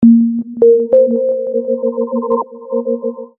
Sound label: boot audio; Walton; Primo-NF2-Plus